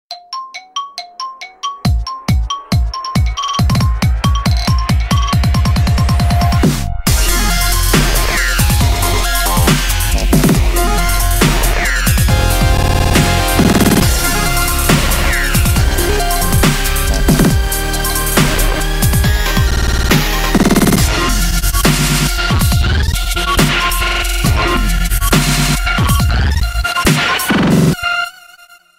Iphone 5s

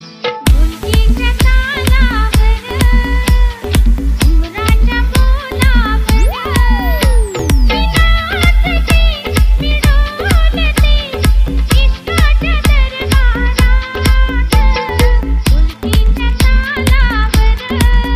marathi dholki, ringtones

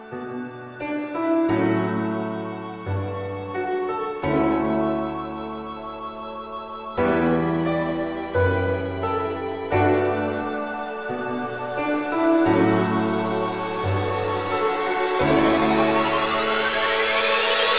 DUBSTEP; MINE CRAFT

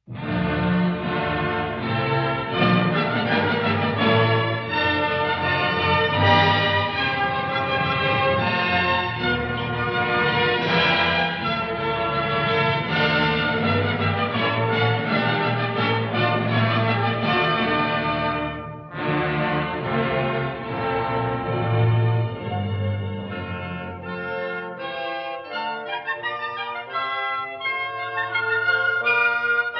Orchestra

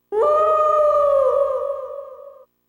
Wolf Howl; SSBB

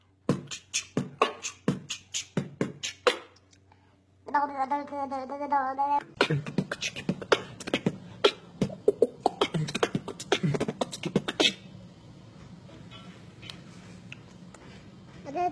Beatbox vs Baby

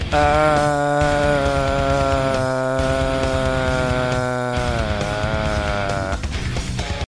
Short Scream

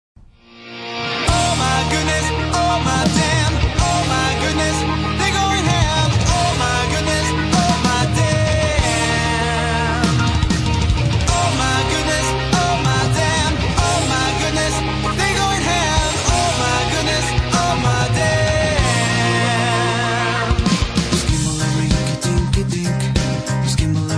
rock cover

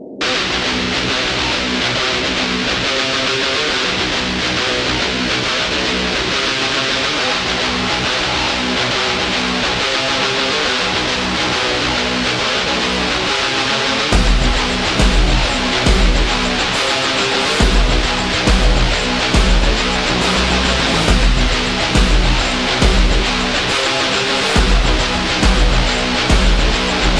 Rock

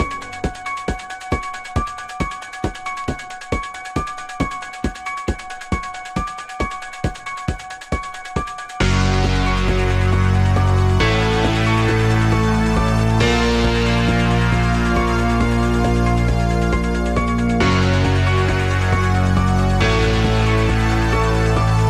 Vinyl

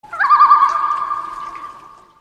gavia immer; animals

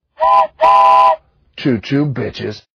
Choo Choo

